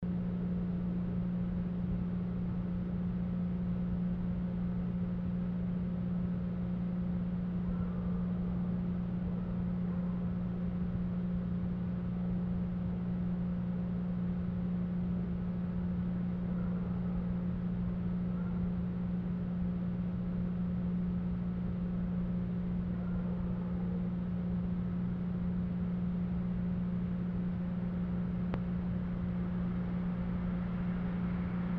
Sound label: Dome Noise at the window